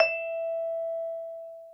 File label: VIBE E5, VIBRAPHONE; Greytsounds VOL-1